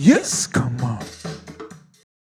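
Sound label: vocals, Roots Disco; USB Soundscan vol.32; AKAI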